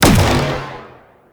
Laser Disc Moa Fire; Warframe; Venus

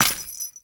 Step Default, Footsteps; Enemies; Foley; Warframe; Grineer Royal Jester

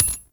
Flak Cannon Shell Impact; Warframe; Weapons; UT Weapons

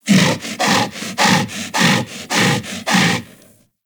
Kubrow Armored Vocal Breath Run; Warframe

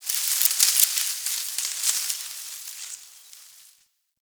Foliage Rustle, Ambience; Warframe; The New War; Zariman

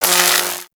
Lightning Rifle Spark; Warframe; Corpus

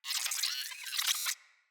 Space Drone Pain; Enemies; Corpus

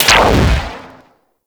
Pistol Explosive Impact; Warframe; Weapons; Sapient Pistol

